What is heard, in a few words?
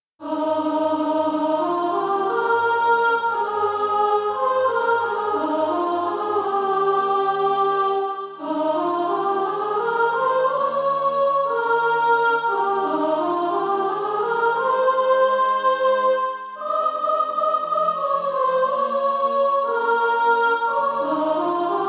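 soprano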